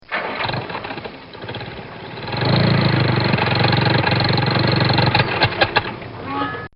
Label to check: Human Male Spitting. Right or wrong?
Wrong